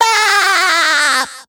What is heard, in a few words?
Baah